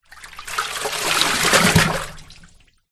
household towel wet lift from water washing machine drop back in